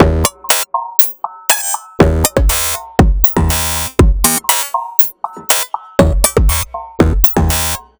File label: Beat, Drum Loop; Birex Spinal Beat; Sonic Foundry (Sony Creative Software); The Electronic Music Manuscript Collection; Drum Loop Structures